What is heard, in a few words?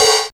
sharp open hat a key, Royality free open hat sound tuned to the A note. Loudest frequency: 3620Hz; open hi hat